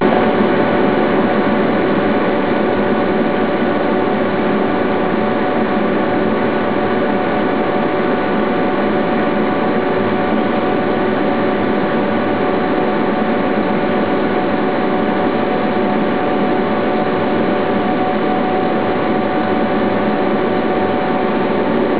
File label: compressor sound; fluid